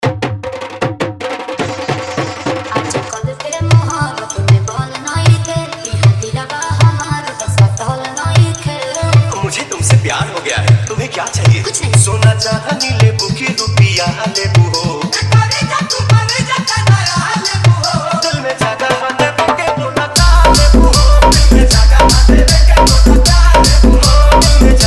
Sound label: Hop Bass Vibret mix